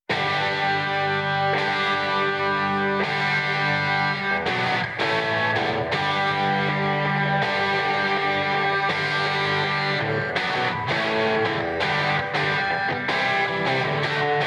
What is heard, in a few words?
guitar